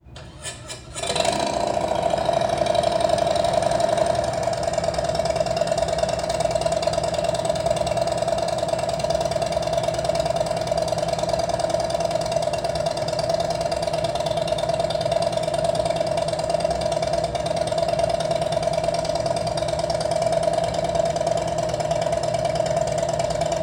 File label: DBX40 Idle